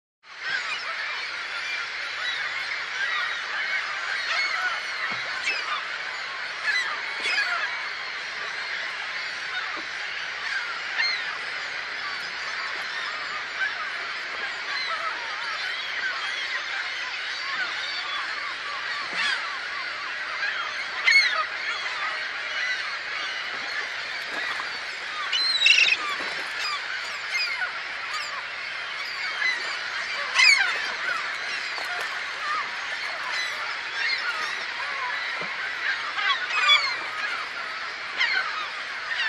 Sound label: Flock of birds in sea shore